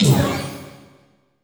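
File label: bass drum fx, perc fx; Zero G; Malice In Wonderland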